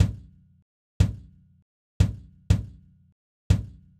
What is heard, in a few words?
Kick; hydrogen